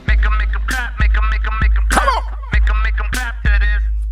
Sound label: clap